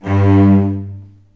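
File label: G1 arco long cello, left; East West; The Ultimate String Collection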